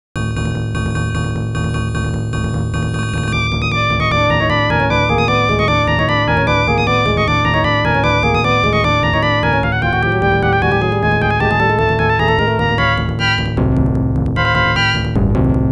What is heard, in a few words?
BOSS BGM